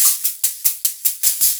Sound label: SHAK, Percussion Loops; 150 COMBI